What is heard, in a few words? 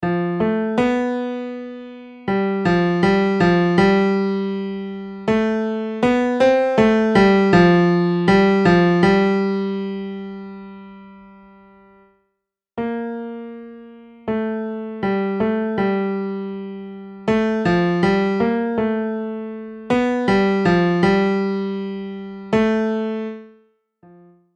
melody